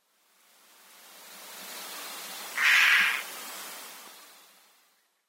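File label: hoopoe, sakata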